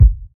nice bass drum single shot a sharp key

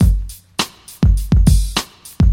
69 bpm drum groove g key; drum loop

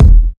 tight lows kick e key; kick drum samples

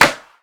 clap D key

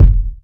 natural kick single hit f key; drum single hits one shots; kick drum samples

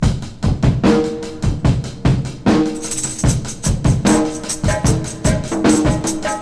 75 bpm drum beat f key, kick tuned to the F note

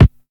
subby rap kick single hit G sharp key

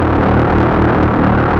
monodelay - D sharp - 82, synth loop; instruments; loops

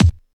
smooth kick drum e key, Loudest frequency: 593Hz; kick drum samples

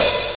soft sounding hat sound D key; hits one shots; hat samples

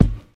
fluffy kick sample g sharp key

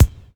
crispy steel kick drum sample c sharp key

